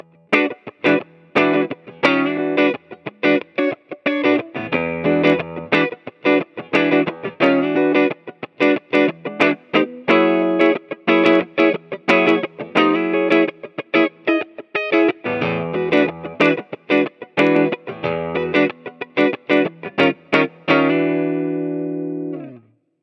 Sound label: Mooer Cab X2 Twinverb.B 2x12 left Twinverb.B 2x12 right Clean